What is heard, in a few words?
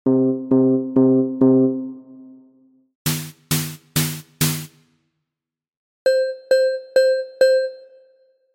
orchestral percussion